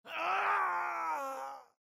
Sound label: Pain Yell; Age of Empires III; cinematics